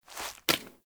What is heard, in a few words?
Queens Chamber Foley Queen Scepter Grab; Warframe; The War Within